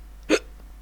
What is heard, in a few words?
Hiccup sound